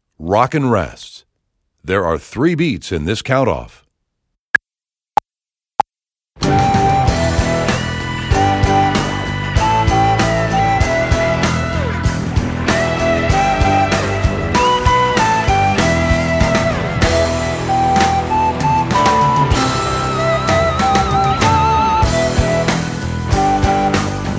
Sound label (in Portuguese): Rockin Rests Com Flauta Guia; Nivel 2; 2.o Ano de Escolaridade; Obra Obrigatoria; 11o concurso de flauta de bisel municipio de redondo